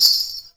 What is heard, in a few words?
METAL, ANKLE PODS; Spectrasonics; Heart of Africa